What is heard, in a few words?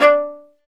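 viola solo, marcato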